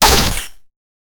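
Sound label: style attack slash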